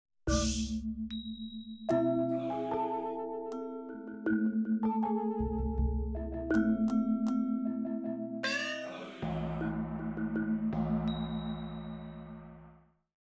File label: Perc Far East; Simmons SD1200